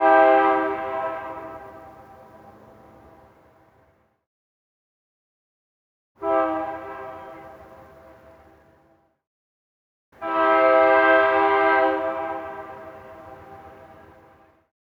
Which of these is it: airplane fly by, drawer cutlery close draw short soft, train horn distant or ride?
train horn distant